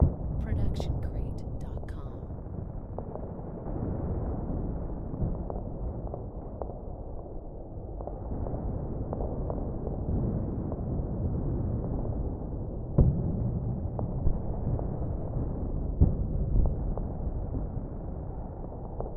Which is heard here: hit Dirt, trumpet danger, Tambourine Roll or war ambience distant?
war ambience distant